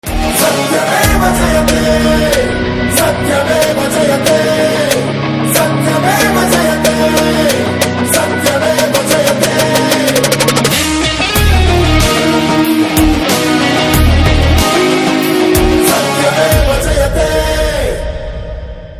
Ringtone; Vakeel Saab

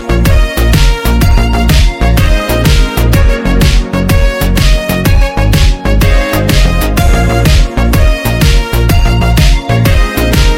Instrumental Tones

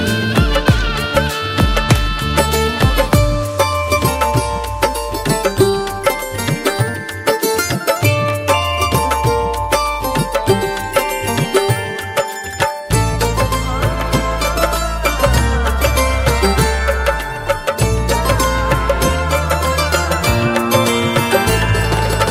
Instrumental Tone

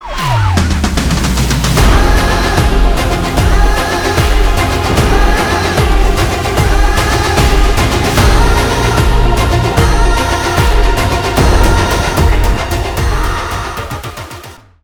BGM Ringtone; Nenjuku Needhi

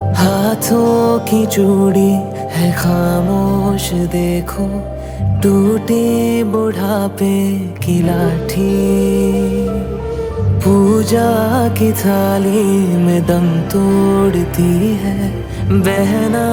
Ringtone

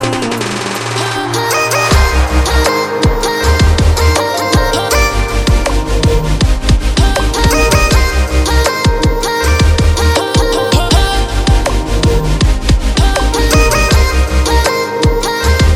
Remix Version Ringtone Instrumental

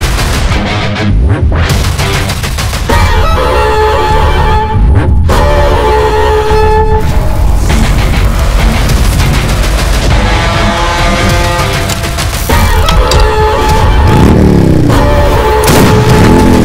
Instrumental BGM; Yuva

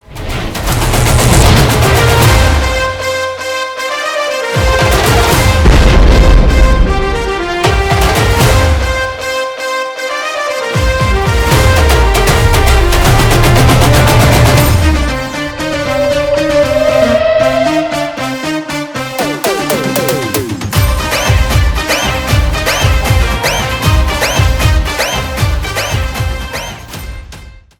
Instrumental

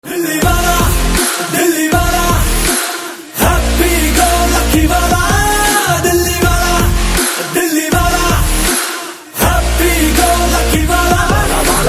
Telugu Ringtones and BGM; Disco Raja

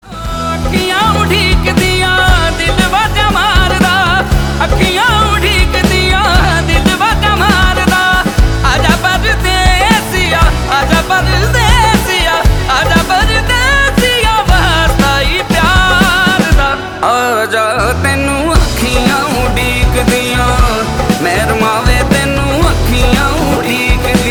Ringtone

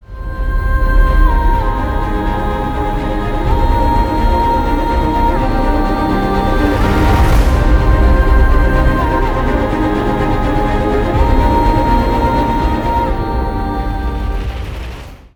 Instrumental Bgm

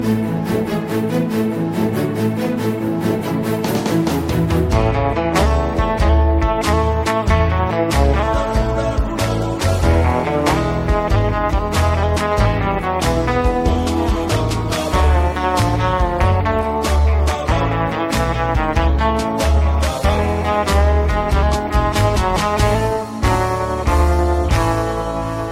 Instrumental Tone; Thalaivii Movie

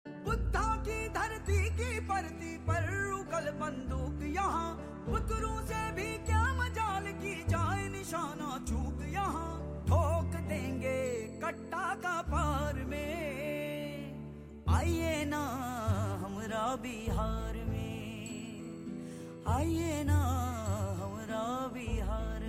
Intro Song Ringtone; Khakee

